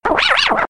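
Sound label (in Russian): звук царапин на виниле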